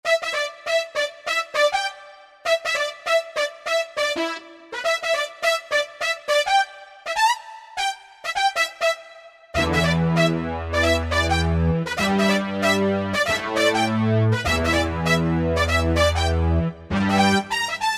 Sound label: Korg MSC-05S Killer Horn; Korg MSC-05S Piano